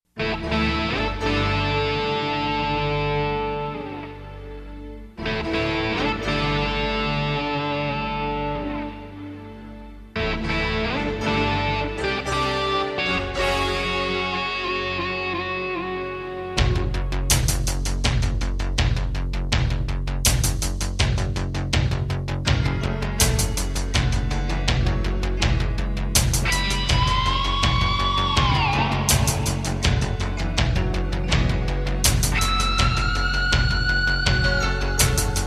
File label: Guitar Version